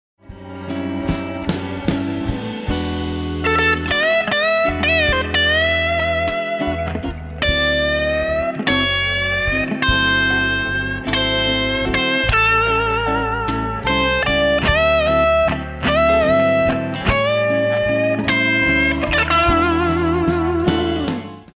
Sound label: Blues Lick, guitar; Blues Guitar Method 1